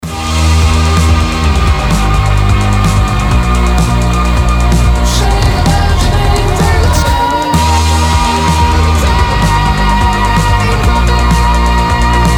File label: loud indietronica